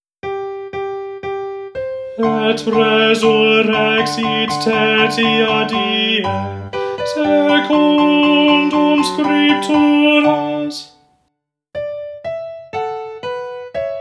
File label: Tenor